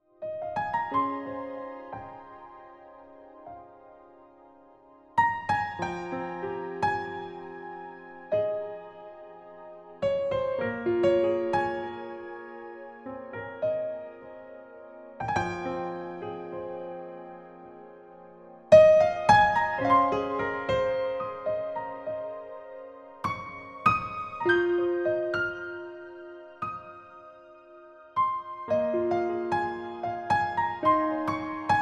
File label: Beach Piano Collection